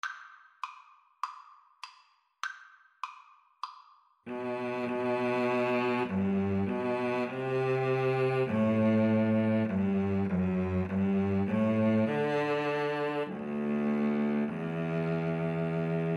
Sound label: violin cello, Violin-Cello Duet